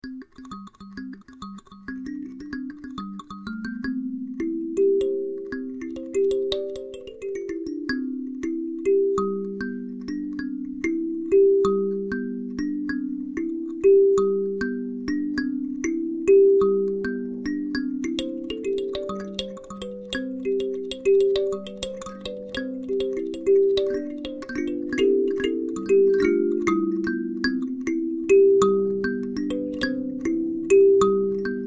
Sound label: Cat Gourd